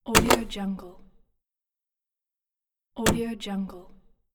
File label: user interface mechanical cancelling